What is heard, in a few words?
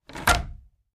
Attic Closet Door Clo